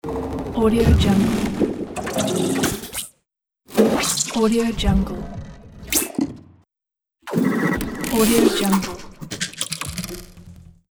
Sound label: scifi space machine glitch